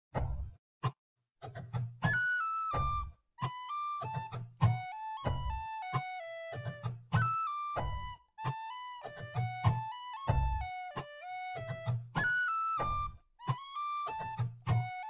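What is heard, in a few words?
whistle percussion slow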